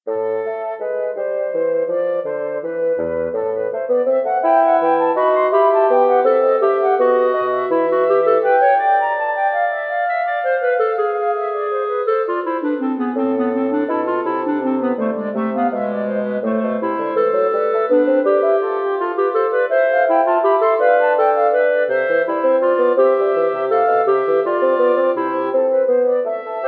bois; bach johann sebastian; 15 inventions a 3 voix

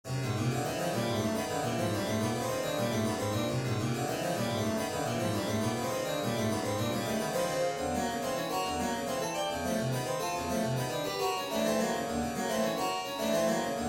prelude en sol pour clavecin

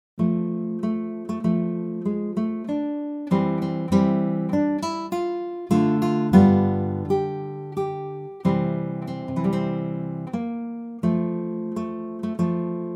guitare etude marcia

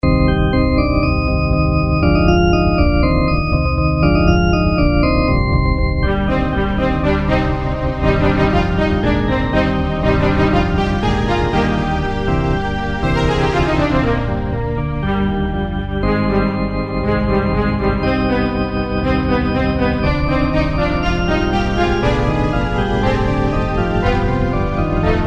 duo violon orgue

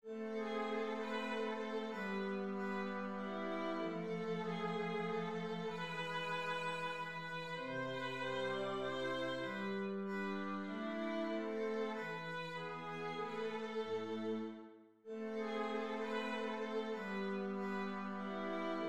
menuet mineur; purcell henry

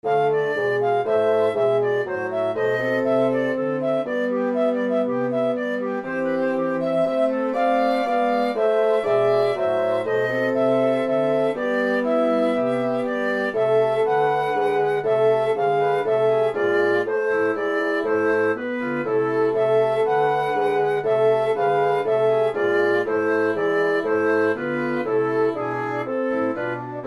quatuor

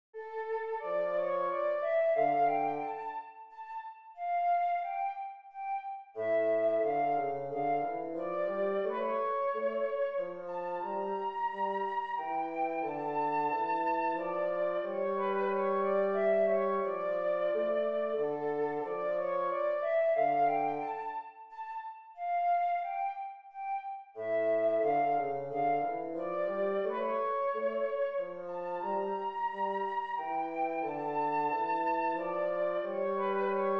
menuet mineur; lully jean baptiste

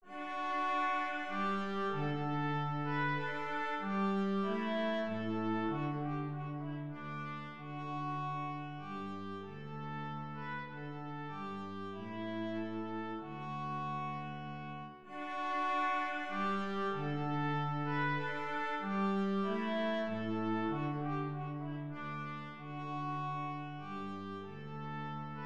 menuet majeur; lully jean baptiste

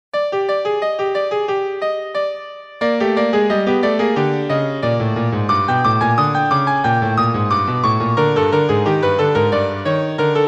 piece pour piano; vignon denys

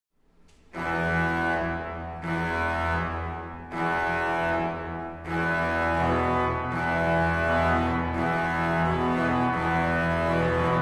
les cloches pour orgue clavecin; orleans

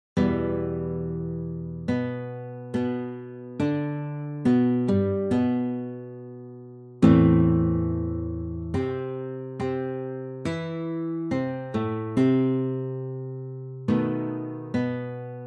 marche funebre

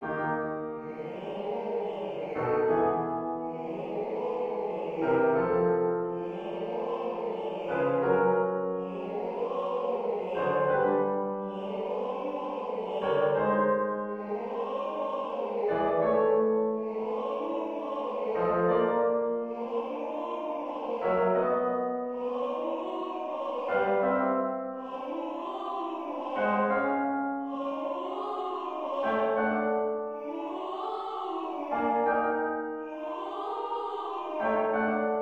mise en voix echauffement vocal exercice vocal vocalises

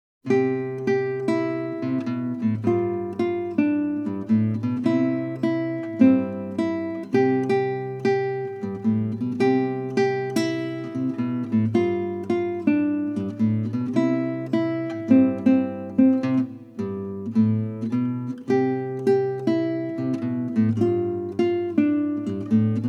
petit jazz; plage

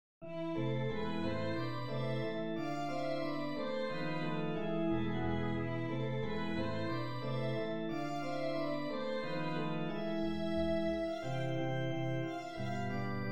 rigaudon mineur

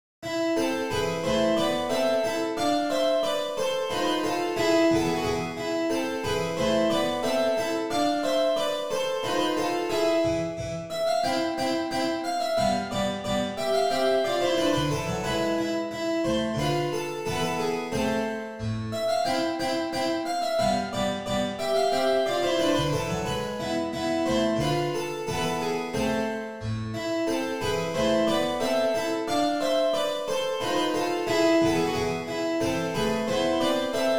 rigaudon mineur voix; babell william

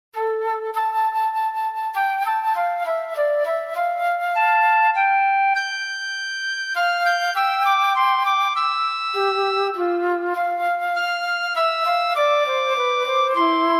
petit duo en la pour flute et piccolo

